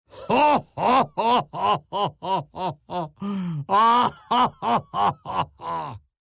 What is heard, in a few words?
Man laughing